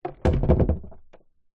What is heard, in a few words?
sport surfboard longboard down on hard ground